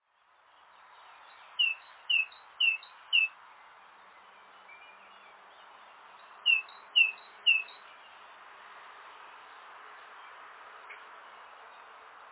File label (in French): mesange charbonniere, Mésange charbonnière; enregistrés par Jacques Prévost de la LPO; chants d'oiseaux